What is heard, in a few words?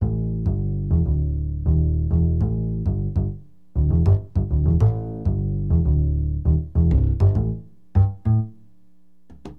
BASS, AcidJazz Funk; hiphop; LiveAct Pool 1